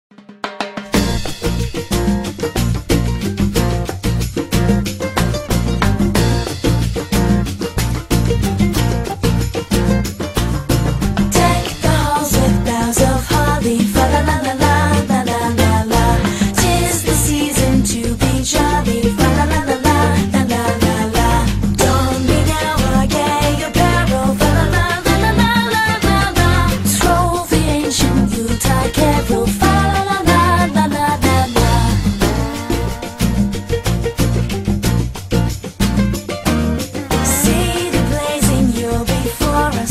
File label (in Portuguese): Dueto